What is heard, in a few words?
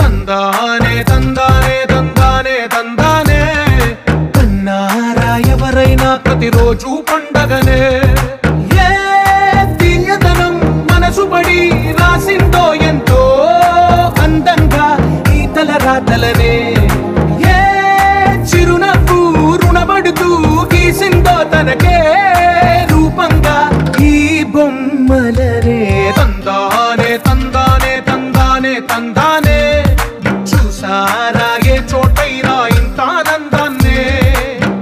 Telugu Song Ringtone